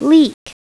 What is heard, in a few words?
leek